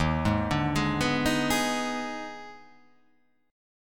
D sharp Major 7th Suspended 4th Sharp 5th

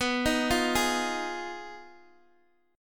B 6th Flat 5th B down Guitar Open D

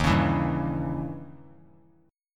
D sharp 7th Flat 9th D sharp; strummed; D#7b9; JGuitar

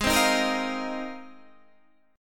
Ab 6th Add 9th down Guitar Standard; strummed; JGuitar

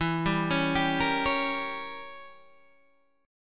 E Diminished 7th E, Guitar Open G

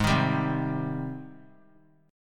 Ab Major 7th Ab down 3C 3F 3A 3D; AbM7 strummed; JGuitar

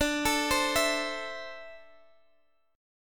D 7th Suspended 2nd, Guitar, Open D

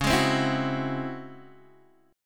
Db Minor Major 11th Db Guitar Open D

